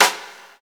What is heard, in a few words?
SNR, Reverbs; Club-50 - Foundations Roland; Verb 8